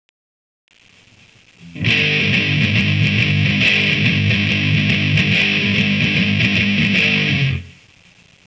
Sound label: G note